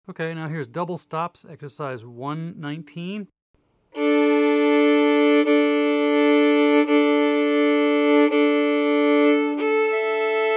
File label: Double Stops